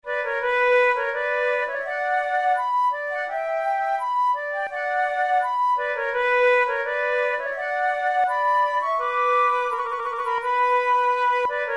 duet, flute tunes; O Charlie is my darling

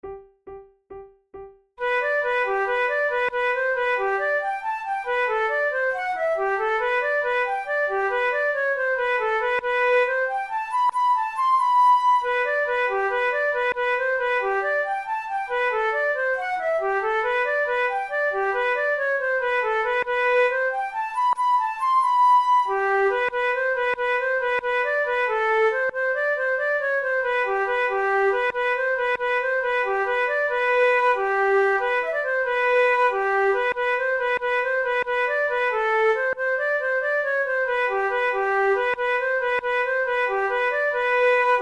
quick step duet, flute; steam boat

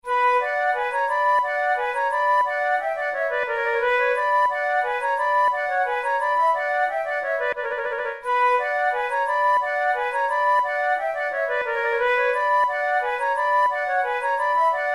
for two flutes; Monteclair; 6 concerts; le moucheron; flute tunes

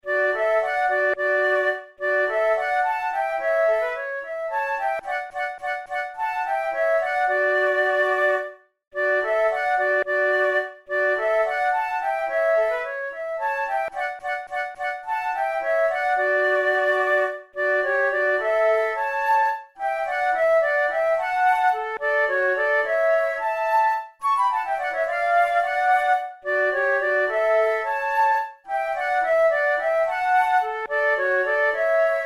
duet, flute

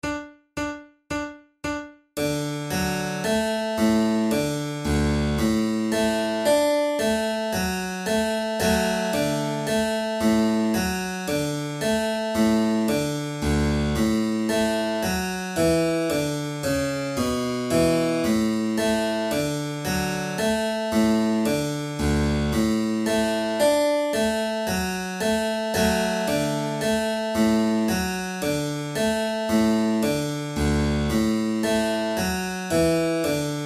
trumpet tune, flute; clarke

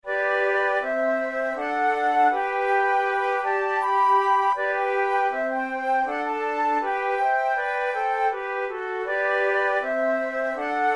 trio

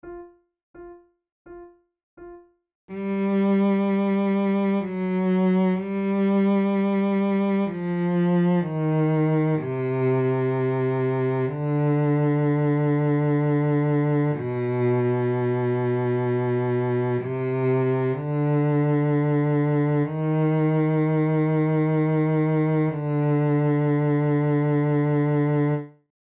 recorder sonata in G minor adagio; Marcello

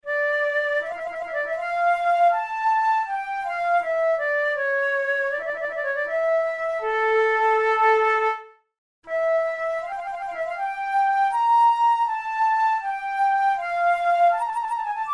studies for the boehm flute

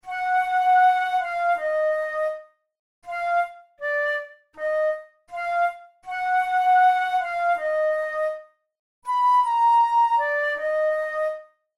flute studies in d sharp minor; dothel

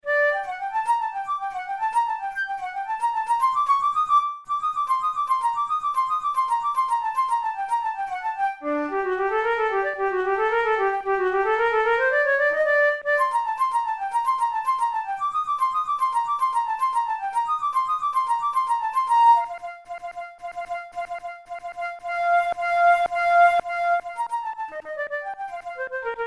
melodic exercises, flute tunes

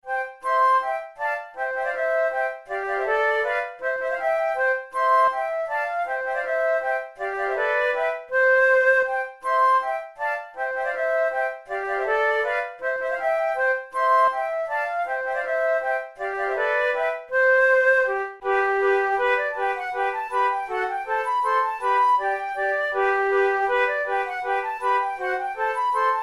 waltz trio, flute

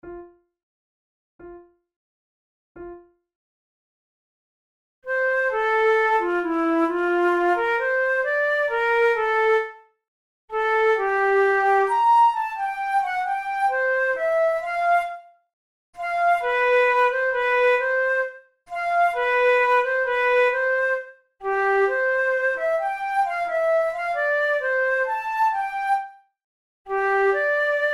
duet larghetto

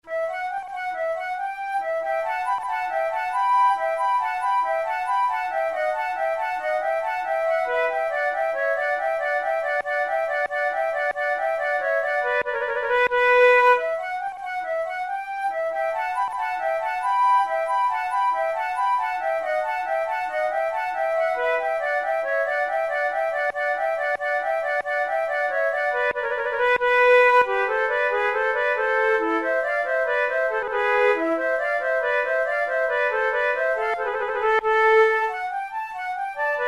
gigue, flute

